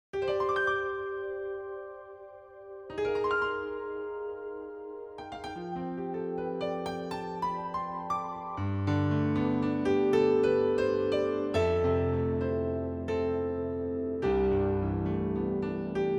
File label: pno st pad; plg150-pf